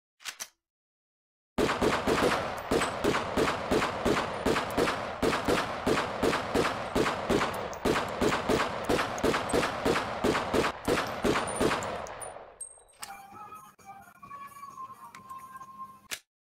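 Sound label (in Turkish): gercek silah sesi ak 47 kalasnikof sesi; ak47 tufekler; turkiye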